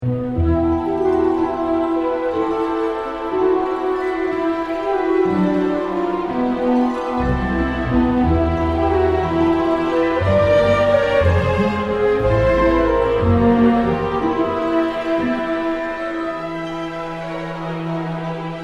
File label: music Loop; casa dos gritos